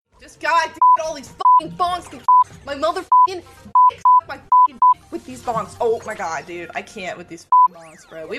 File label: bonk